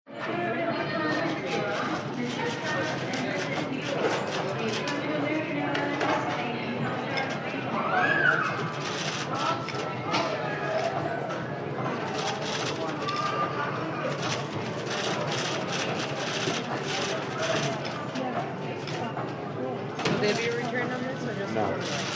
Check in area, general lobby atmosphere, p.a. & computers